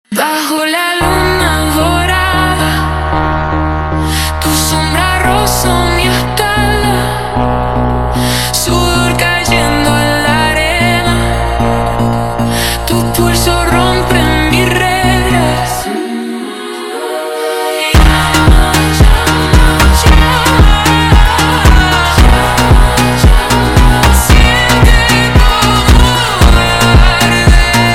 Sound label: Montagem sped Up